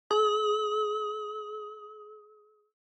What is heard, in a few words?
Single Accent Low